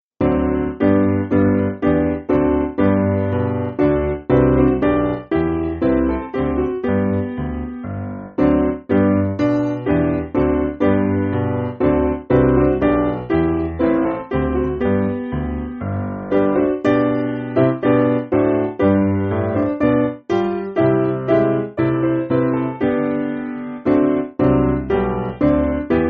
Piano

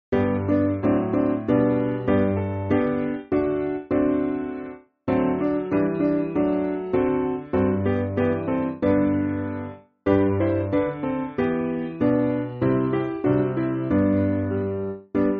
Jesus Bids Us Shine SPiano

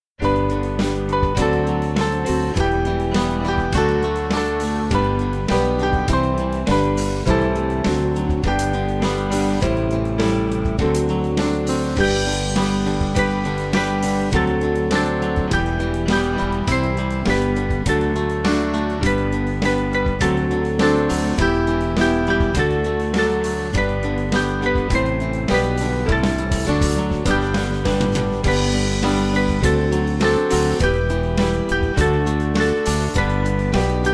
Thanks To Thee, Diolch I Ti, Band; Small Band

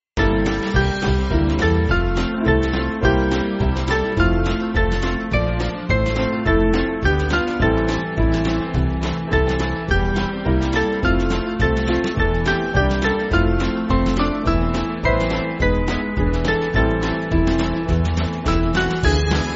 Christmas Carol Band; O Little Town

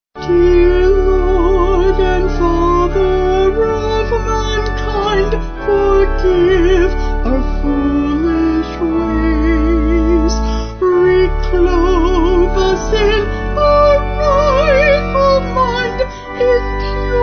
Vocals Organ

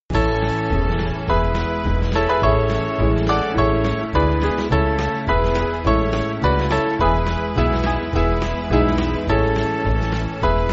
This Earth Belongs Trumpet SBand

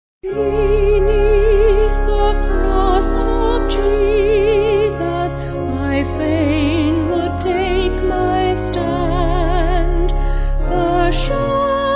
Vocal Organ; Beneath the cross of Jesus I fain would take my stand

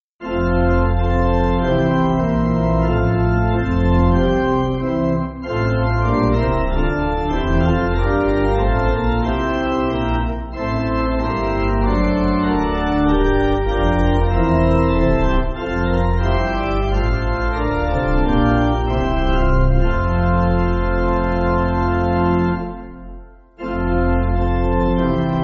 Pipe Organ, Music Backing Files for Churches; Here Is My Heart; Steiner; J. Steiner

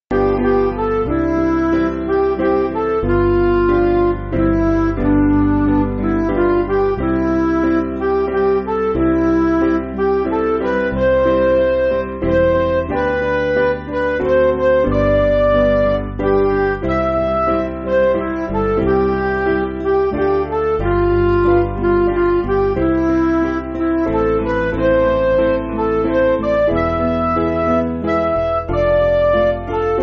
Midi, Music Backing

